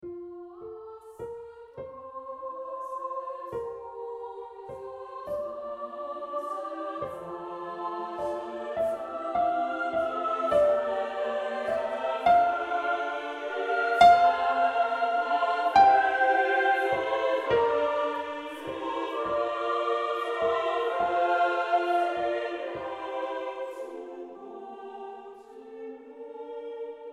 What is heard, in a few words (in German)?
Sopran1; Brahms